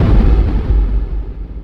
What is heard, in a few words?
missile fire; weapon